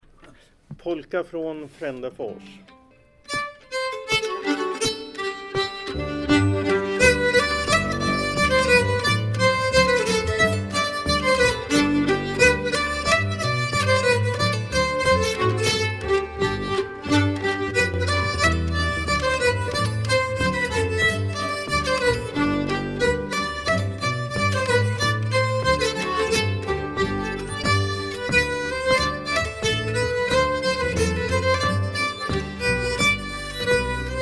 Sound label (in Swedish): Polka G-dur; fraan Fraendefors